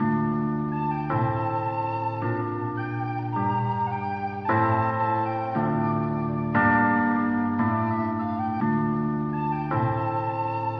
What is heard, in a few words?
flute samurai warrior, Loops, Jazzmatazz